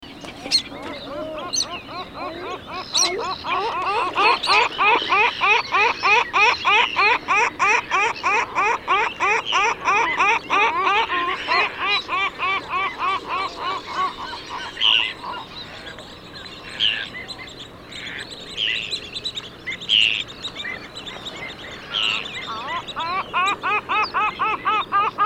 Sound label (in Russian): zheltonogaya chayka larus livens, zvuki chaek